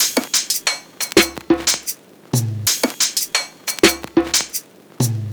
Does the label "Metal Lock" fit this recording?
No